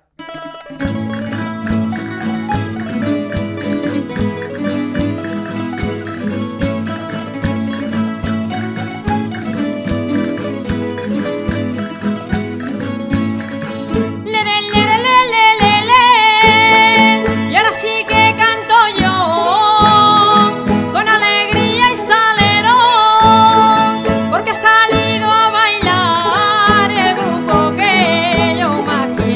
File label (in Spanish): Jota Gitana